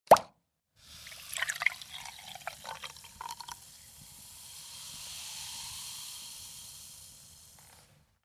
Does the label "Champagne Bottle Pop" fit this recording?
Yes